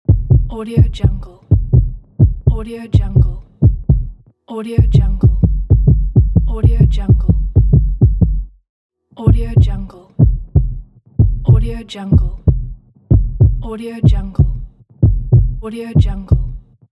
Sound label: heartbeat